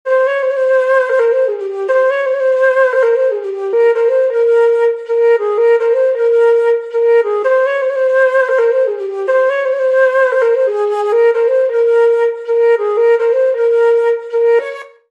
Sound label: Flutes; Drumify; Flutes Pack Vol.2; Multi Kit